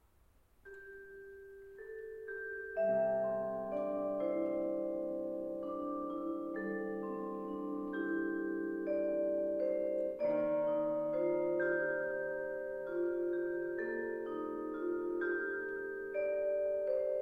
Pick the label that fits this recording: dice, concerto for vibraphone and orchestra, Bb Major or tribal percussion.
concerto for vibraphone and orchestra